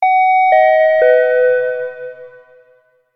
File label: announcement bell